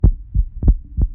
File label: heart beat